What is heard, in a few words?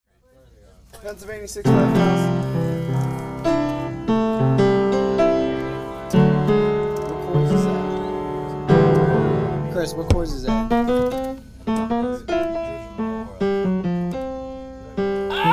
Piano; Folk Fest 2010 Campground Recordings